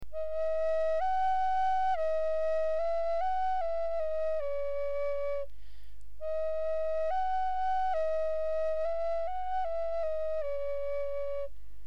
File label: flute